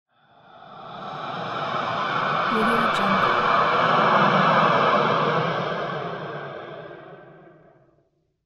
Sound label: space flight